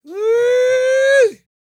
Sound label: E YELL, MALE HUMAN; R&B & Dance; ILIO; Vocal Planet